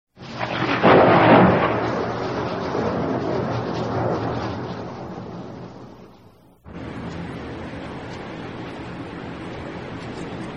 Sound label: storm